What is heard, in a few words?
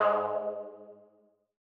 Lead G#2; KG MegaSaur